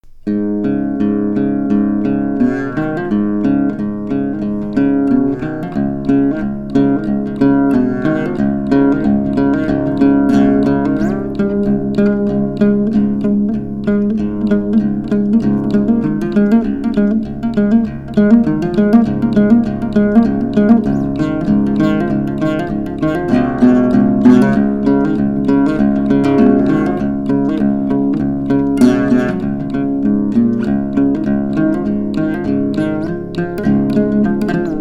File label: doshpuluur; Instruments